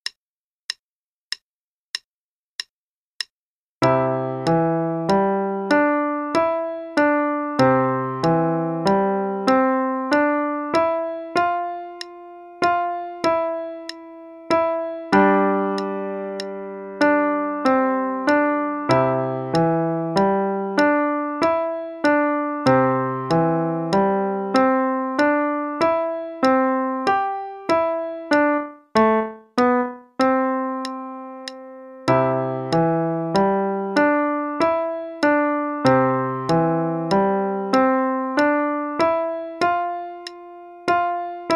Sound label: The Spanish Guitar (qn=96); Level 1 Performance - page 03